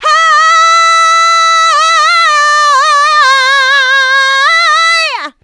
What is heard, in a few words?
Vocals, Adlibs; Houseworx